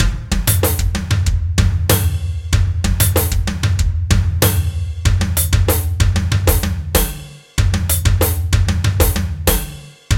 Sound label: metal